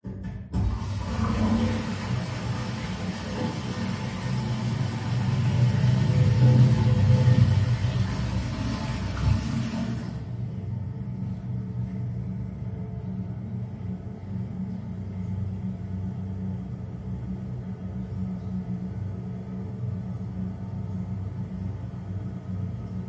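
household toilet flush cistern fill muffled adjacent room; zapsplat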